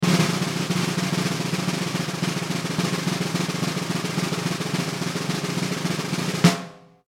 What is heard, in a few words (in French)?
Roulement tambour; Cirque